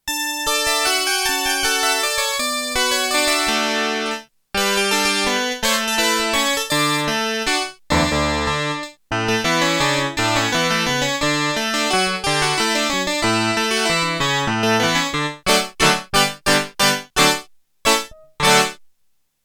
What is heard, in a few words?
HARPSICHORD, Korg DW-8000; Factory Patches